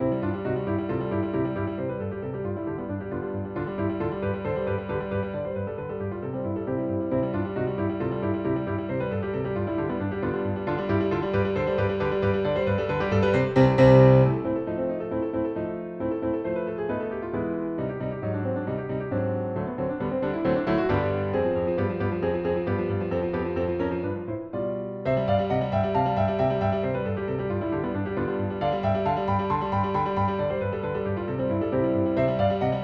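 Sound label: Rondo Gallop, piano; Rondo-galop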